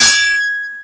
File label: SW HIT, sword hits; Total Control - Action Cutz